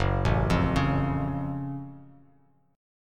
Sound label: Gb Minor Major 7th Gb down Bass Standard; arpeggiated; JGuitar